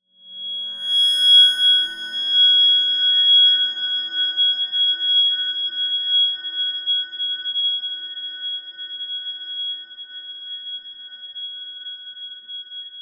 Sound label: horror whine shrill high pitched; zapsplat